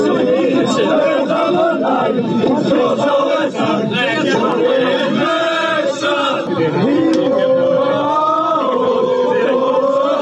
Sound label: chants; sofien moussa